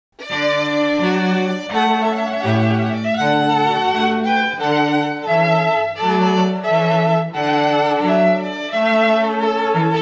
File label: trumpet voluntary, strings